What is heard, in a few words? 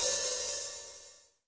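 hi-hat, Real Mega Drums; Best Service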